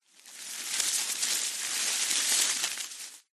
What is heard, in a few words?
magic plant vines leaves movement wrapping around reversed; zapsplat